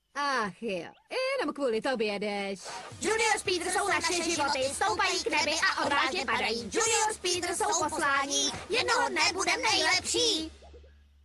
(Czech); Junior Speedsters Chant; Season 1

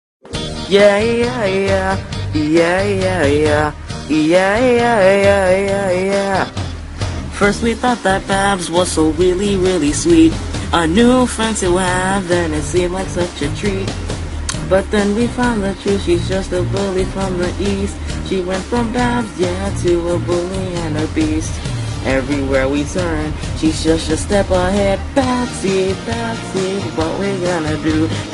Vocal Cover

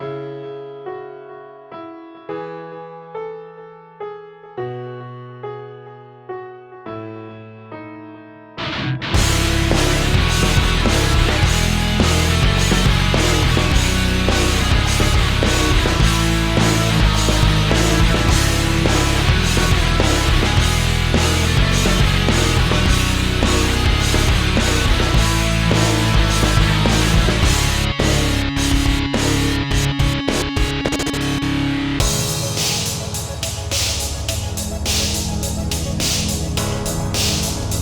(Instrumental)

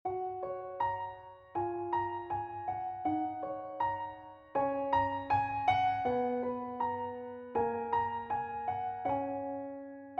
Instrumental, Pop-Punk

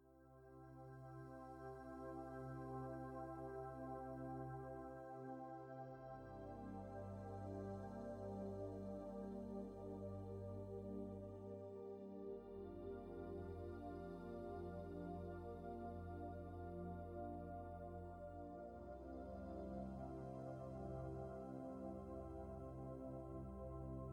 Spa Backing; Blank & Brainwashed

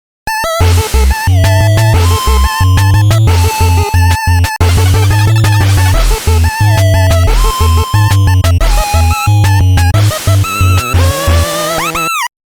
8-Bit

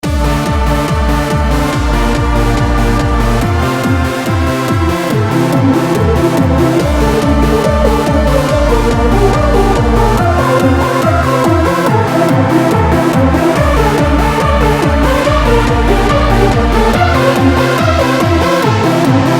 Hardstyle

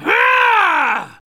Grunt; Lewd SFX

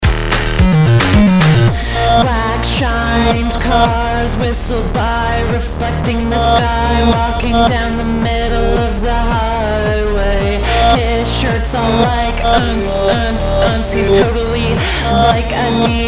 Moombahton

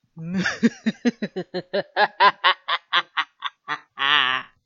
Evil Laugh, Panto Style

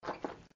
slosh; Lewd SFX

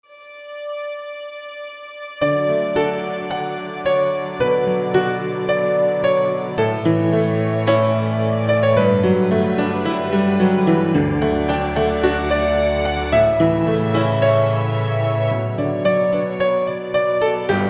Orchestral Theme; MLP

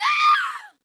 Short Scream; Lewd SFX

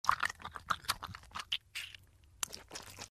Suck; Lewd SFX

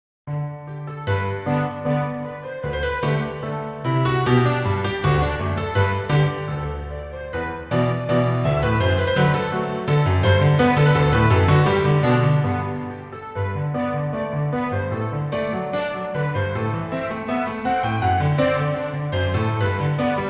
Orchestral Arrangement

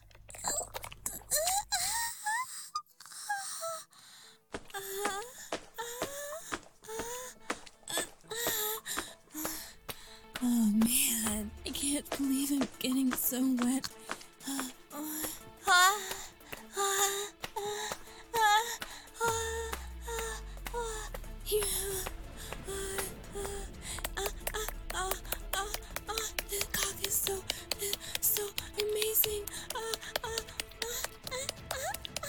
Mid to High Pitch - Female; NSFW; R-18; Hentai Sample

